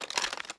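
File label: inspect movement; cstrike; valorant; prime vandal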